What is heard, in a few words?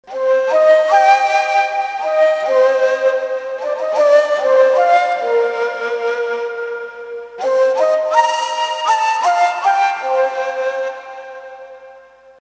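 shaker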